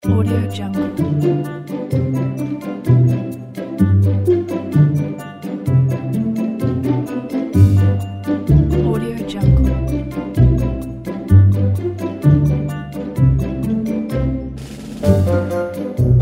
background music for childrens game app

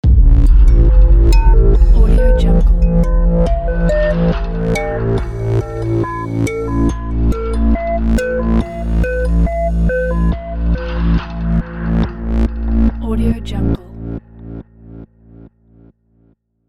storyline logo

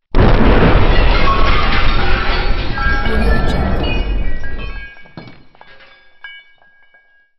crash wood glass

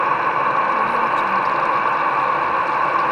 metallic water pipe flow loop

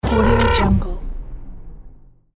mech activation

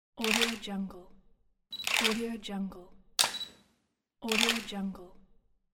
photo shoot

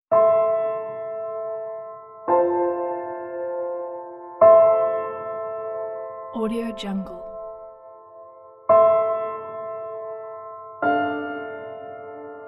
sad piano

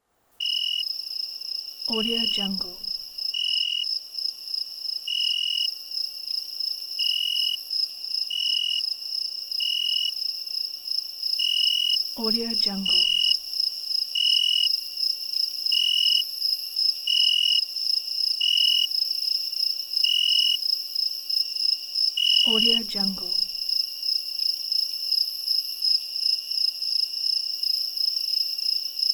crickets

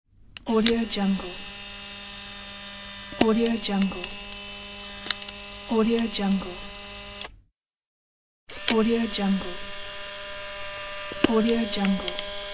polaroid

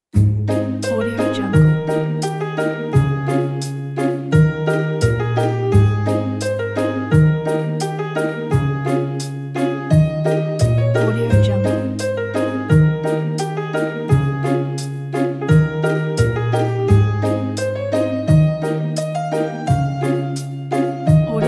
happy sunshine

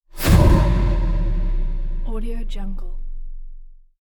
futuristic swoosh boom; volkovsound

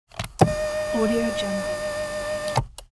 adjusting electric car seat

